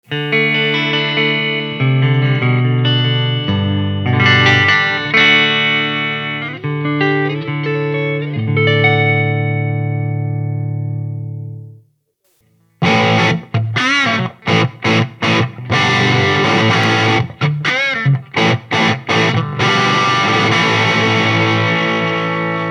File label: acoustic